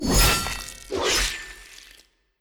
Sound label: Attack Knighgt Sword; Legend of Keepers (gamerip) (2019)